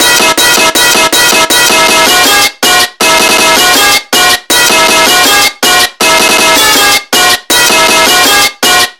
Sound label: pipe; doodle dance